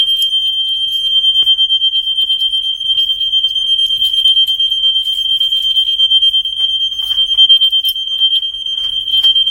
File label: Door